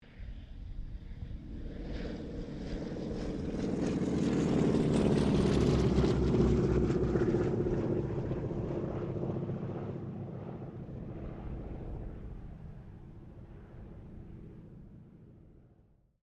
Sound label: AIRCRAFT WINJEEL SQUADRON 2 PASS, 2 Australian Winjeel Trainers; Airplane; Airplanes; Vehicles